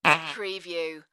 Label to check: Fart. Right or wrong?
Right